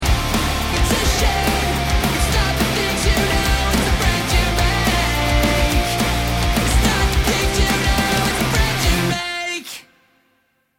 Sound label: bomb ten sec count, music